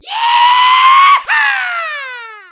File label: Hiiiiiihhhhaaaa